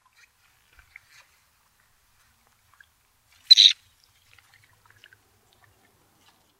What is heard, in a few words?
dunlin